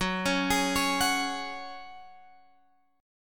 Gb Suspended 2nd, Guitar, Open F; arpeggiated; x,13,13,15,16,13

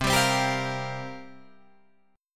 C 7th Suspended 4th, Guitar Drop D, chord

